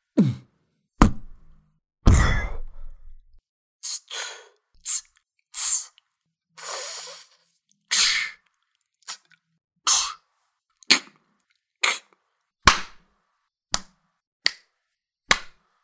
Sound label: house one-shots; Sound FX; Crowdsourced Sample Pack